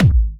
Medium, kicks

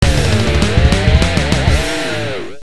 Hard rock alarm; Nokia Ringtones; Audio themes

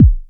Roland SH-09 Bassdrum; Drum Samples

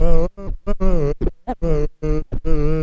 TRANSV, Scratches; The Tables

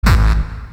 Bombo Hardcore, kicks

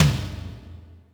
Yamaha RM 50 Toms

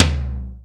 Tom L, Yamaha RY-30 Toms; Drum Samples

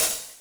CYMBAL, Yamaha RM 50

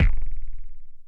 kicks, Bass Drum

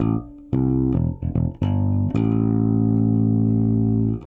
Syncopotion, Bass Drum Kicks

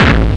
bombo, kicks, Hardcore

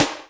snaredrum, Yamaha RM 50

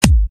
kick

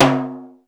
Timbale, Alesis SR-16, Percussion; Drum Samples

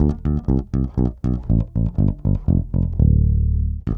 Descending Eighths, Bass Drum - Kicks

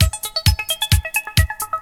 beeps

